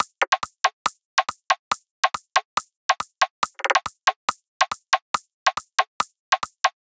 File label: Percussion Loop - 140 BPM; Dubstep; Drums; Loops - Top; Detonate